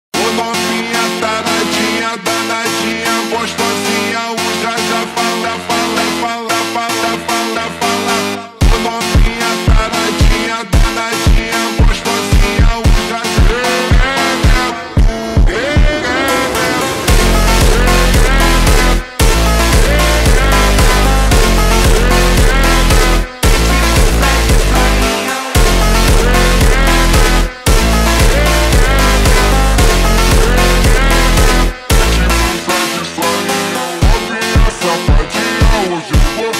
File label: slowed reverb